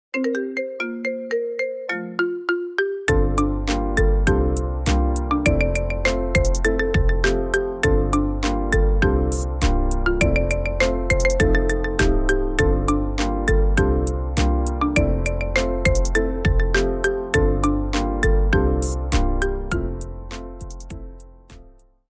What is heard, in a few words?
Marimba Remix